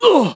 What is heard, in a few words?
HIT; BattleSNDS